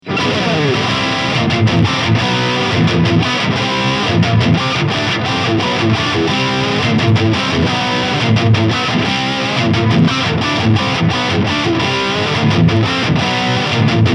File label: RR X Series Lead